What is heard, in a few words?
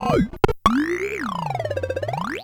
Bent Talking Teacher, Circuit Bent